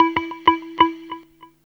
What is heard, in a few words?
FX, Grunge Hip Hop; USB Soundscan vol.34; AKAI